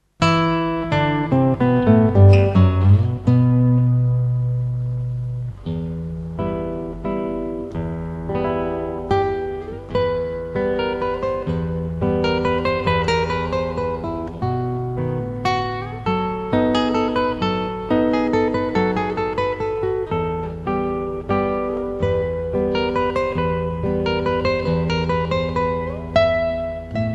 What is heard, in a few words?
guitar